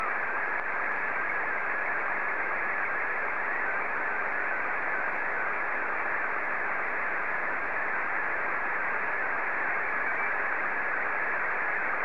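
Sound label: SSTV, Scottie 1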